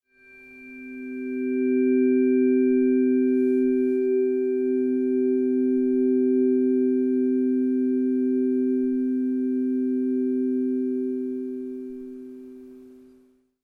256 384 Hz C et G diapasons thérapeutiques

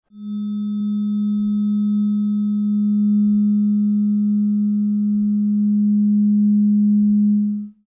uranus 207.36 Hz diapason

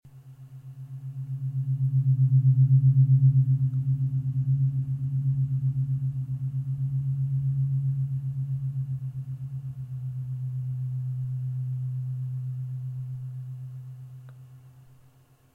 schuman wave diapasons schumann 128hz

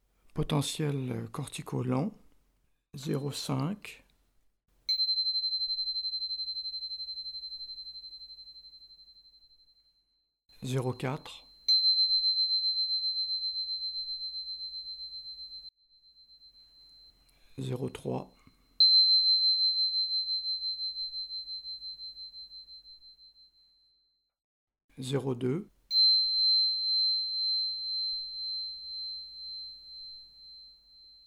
diapasons potentiels corticaux lents

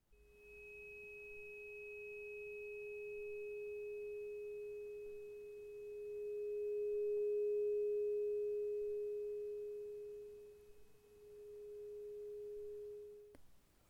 diapason fer 416 hz

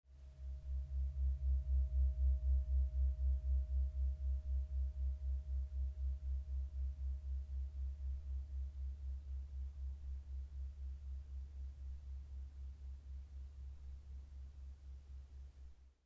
om octave 64 hz, diapasons

